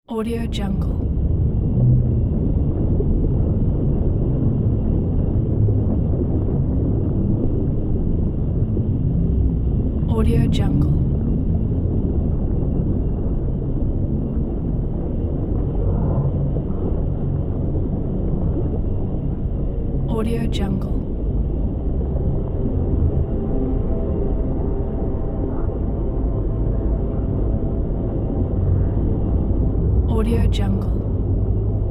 eerie underwater rumble; sound ideas

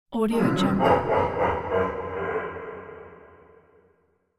halloween evil laugh; zzaudiofx

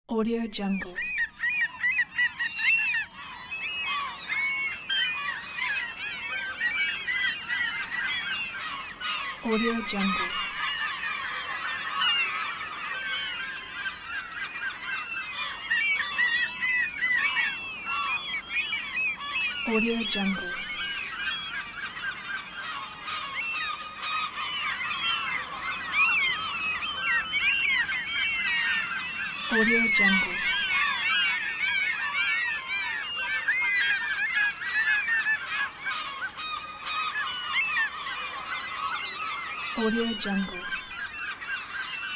seagulls

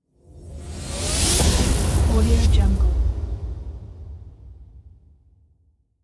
scifi whoosh

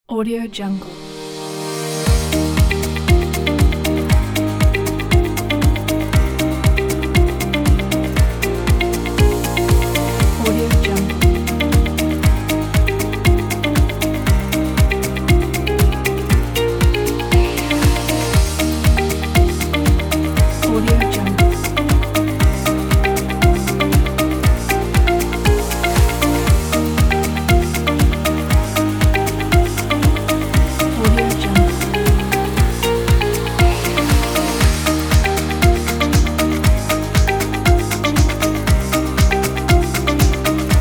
corporate inspiring

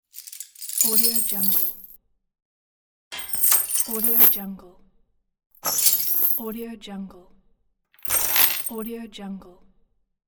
removing chains

